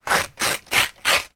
foley card ripping sections; sound effects one

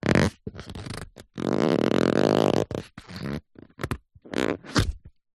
household book telephone directory handle squeak

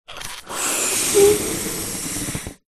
foley balloon blow up inflate short

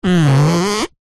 plastic rub squeak with hand; zapsplat; foley

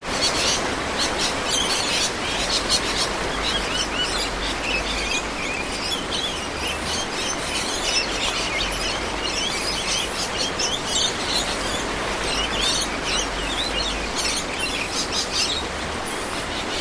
animals birds tropical trees wind

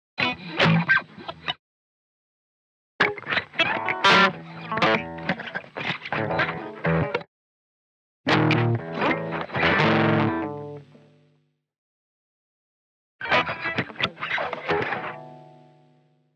Electric Guitar Distorted Pick Up; noise creations

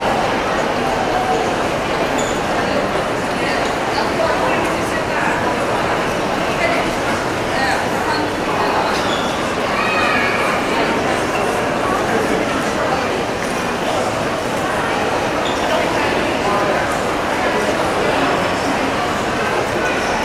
ambience shopping mall internal busy; sound effects one

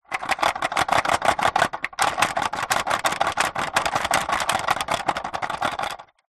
plastic shake rattle loose; foley

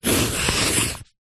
office pencil artists thick draw shape paper

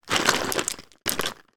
herbal sweets pour from box into hand

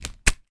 sport swimming googles elastic strap ping

